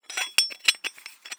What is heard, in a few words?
Hole Saw Scrape; Hole Saw Bits